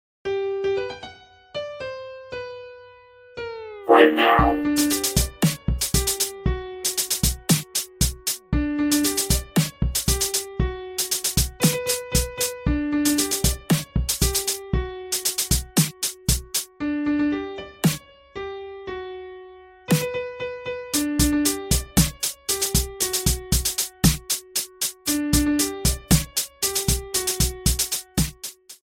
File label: samsung s5 remix ringtone